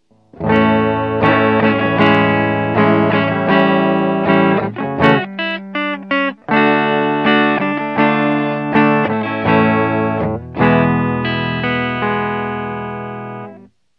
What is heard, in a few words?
clean